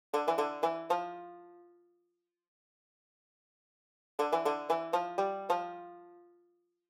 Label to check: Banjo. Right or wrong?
Right